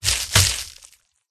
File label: cat attack contact bottom